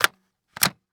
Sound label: Electronic Camcorder Placing Battery On Camera Fast; Panasonic Dvx100